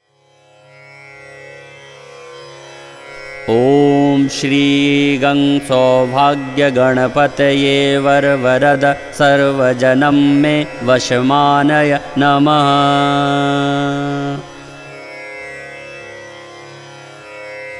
subhlabh mantra

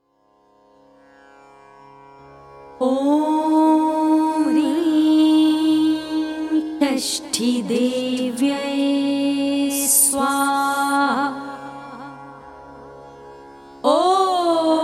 chhath beej mantra

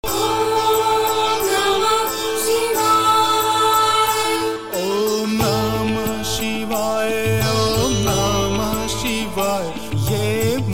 maha mantra

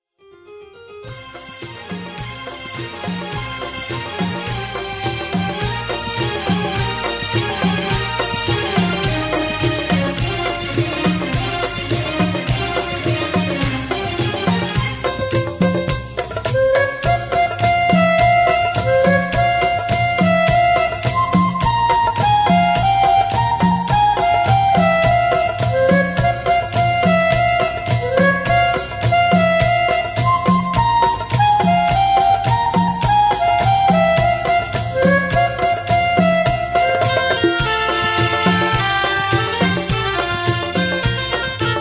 instrumental

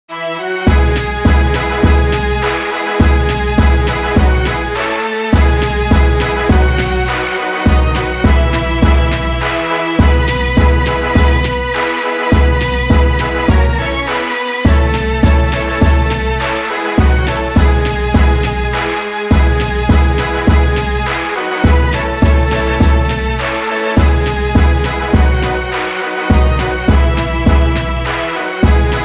national anthem of india

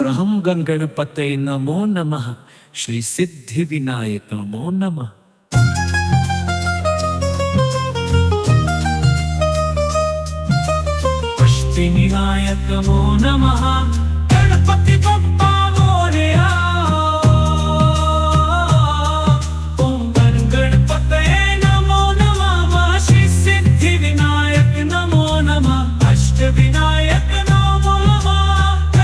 ganpati maha mantra

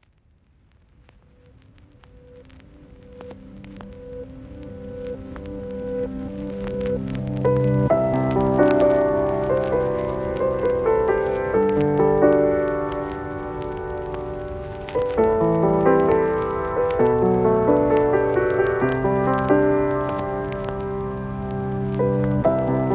lofi instrumental

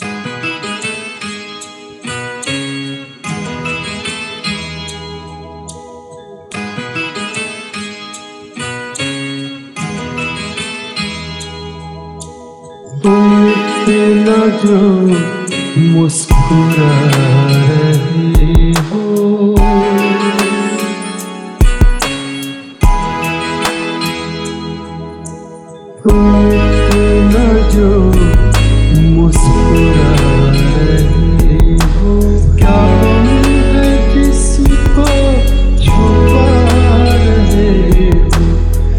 lofi flip

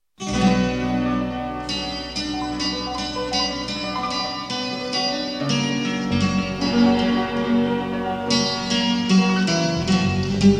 lofi flip

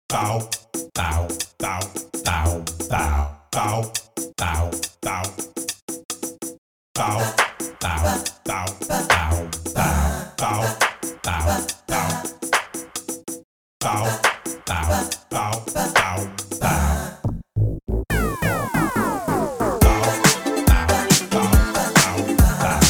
disco

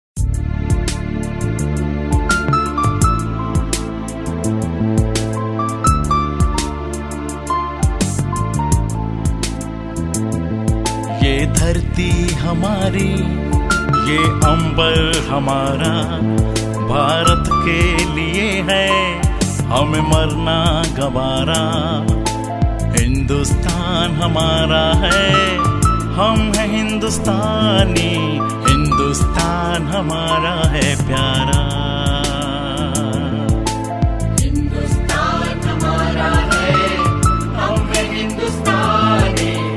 male

